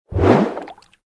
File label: pop open; BingoGrassland